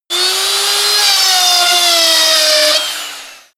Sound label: sander on load